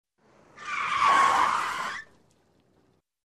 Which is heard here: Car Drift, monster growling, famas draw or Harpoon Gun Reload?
Car Drift